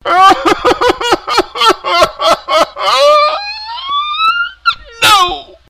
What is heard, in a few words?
cry